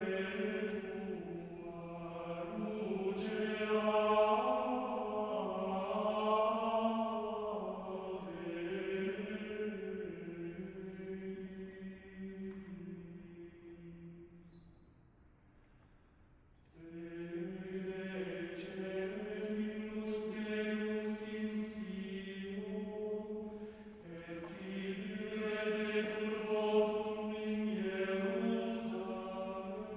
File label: Plainchant (Gregoriaans); Mozart; Requiem